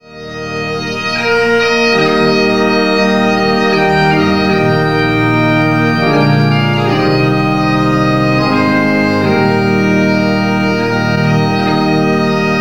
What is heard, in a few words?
Amazing Grace Duet With Organ, bagpiper